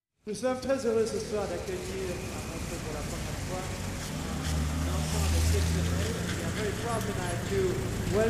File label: city jazz